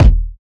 mid heavy kick drum single hit d sharp key, kick single hit tuned to the D# note; kick drum samples